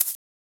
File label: Shaker; FLPRO